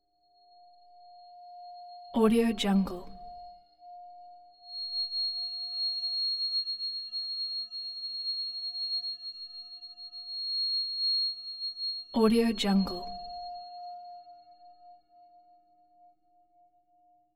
tension waterphone sustained tone bowed approx pitch f5